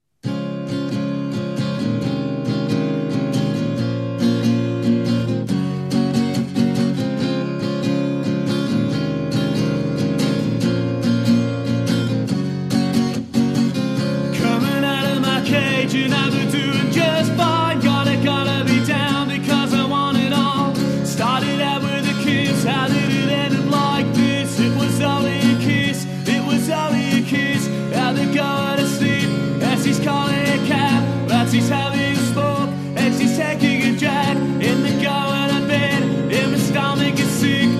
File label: Guitar Voice